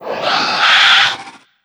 roar hiss; gonarch

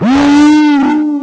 alert, strider; scmod

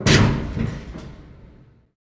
elevator door shut; Genoserv

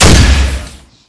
glauncher, MP5

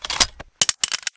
clip out

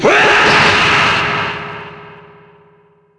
gunfire; gallit

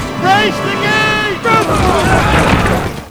gate; nippersounds; helms

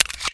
l9 mag out

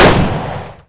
556mm fire

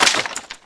bomb timin

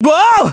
pain; goku

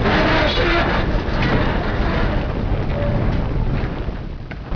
creak; bismarck

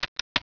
keyboard

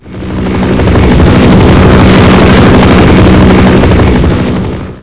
chin fly by, chinook

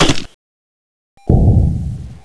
Mortar fire

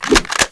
shotgun

